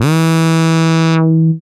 SYN SENETIC, dance synth; Sound & Vision - Gigapack I CD 2 (Roland)

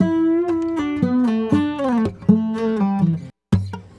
Sarangi, Acoustic String

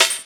SD, Drum & Bass, 160 BPM

